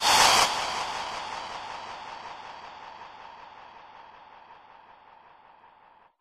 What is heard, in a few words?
shock; animal